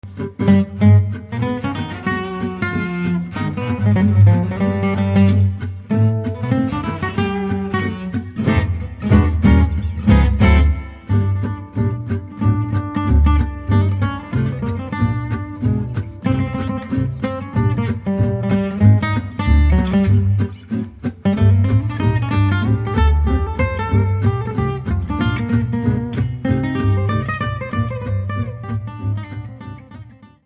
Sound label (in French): Douce Ambiance